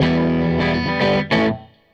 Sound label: Gretsch Guitar Loop